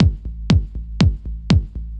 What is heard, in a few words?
Kick, Trance